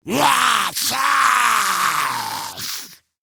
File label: Attack Grineer Ghoul; Grineer Ghoul Expired; Battle Barks